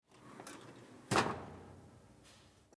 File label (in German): Schublade